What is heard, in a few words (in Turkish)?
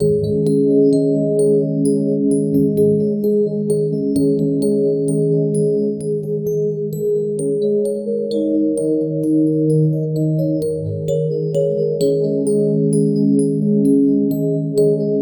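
ambient rüya gibi hafif, bells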